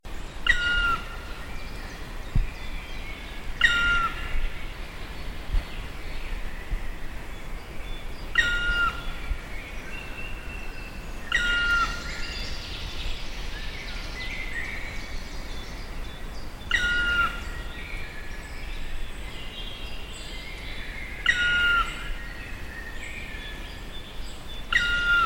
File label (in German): Schwarzspecht; Kehlheim KEH; Daniel Honold